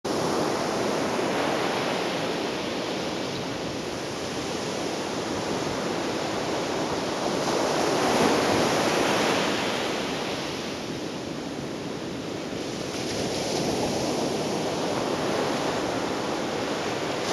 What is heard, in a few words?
Ocean Waves Trinidad North of Balandra Bay